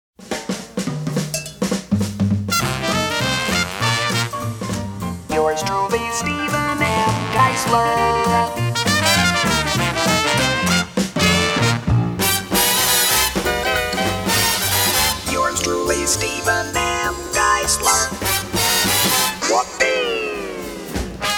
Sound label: Jingle; Short Attention Jingle Show